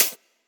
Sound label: hat; Dubstep Sample Pack